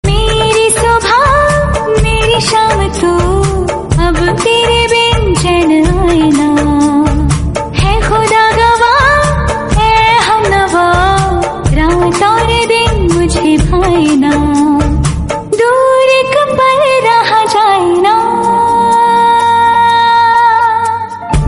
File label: hindi song